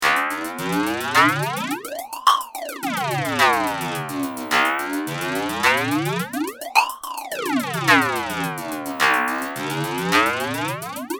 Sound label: flanger